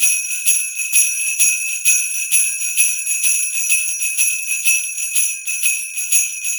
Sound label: Sleigh Bells Loop